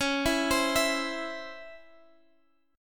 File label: Db Minor Major 7th Db Guitar Drop D